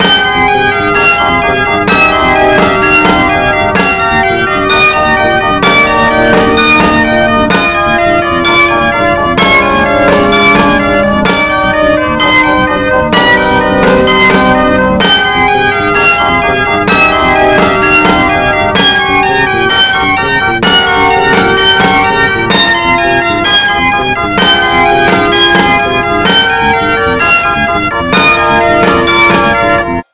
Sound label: Car Horn